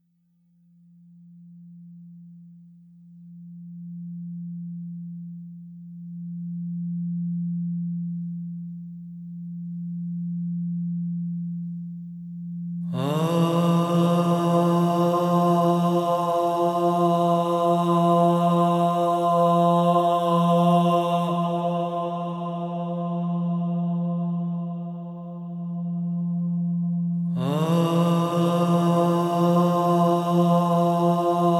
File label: overtonal singing; Yoga and sound